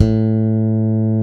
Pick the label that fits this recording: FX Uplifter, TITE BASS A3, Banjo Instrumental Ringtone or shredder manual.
TITE BASS A3